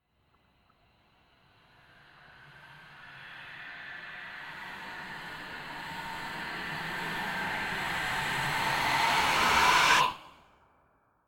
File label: Horror Build Up; Witches Coven